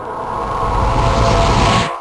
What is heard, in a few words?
meteor launch short; spells